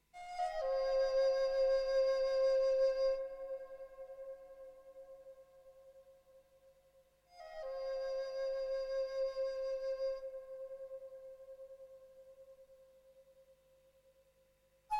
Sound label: bowlsong